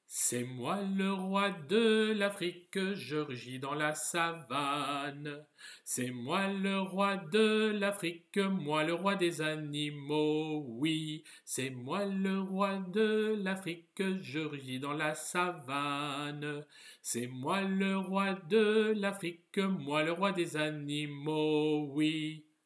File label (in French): paroles lion; Le thème du lion